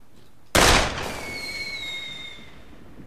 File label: Shot Flare gun